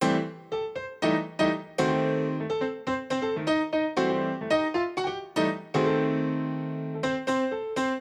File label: Solo; Jazz (Джаз)